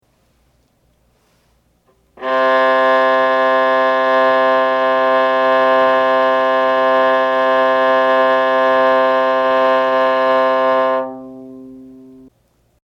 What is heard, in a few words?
Tune Viola C; tuneguide